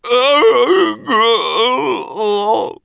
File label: Peasant Warcry; wowpreklad; czwow